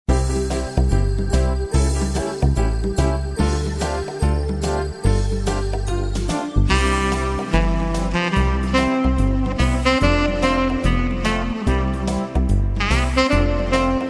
Nice Xmas Tone

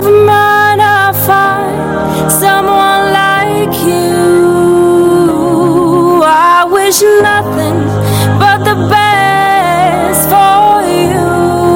Beautiful Voice

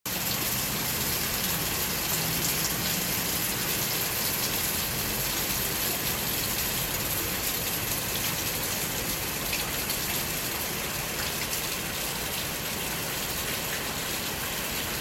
Rainfall

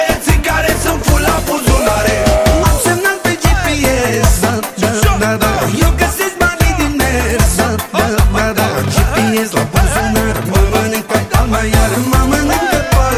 Manele

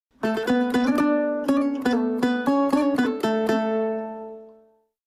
Vietnamese Tune

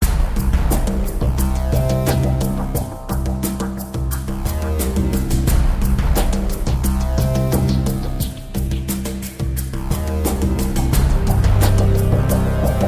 Trance Sound

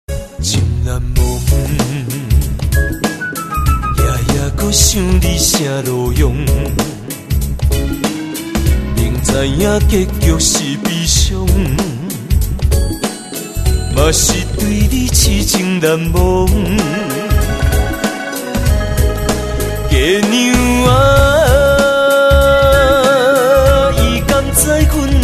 Taiwanese Song

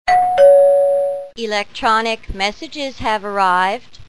Message Arrival

